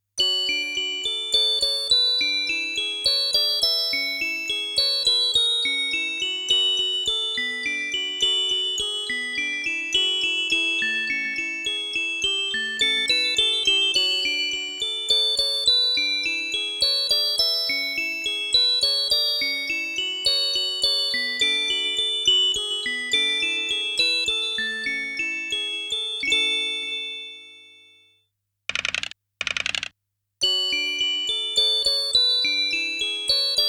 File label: Music Box; Last Bible III